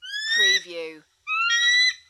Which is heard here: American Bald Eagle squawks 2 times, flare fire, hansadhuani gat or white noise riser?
American Bald Eagle squawks 2 times